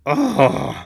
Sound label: Groan; Sly Groan 01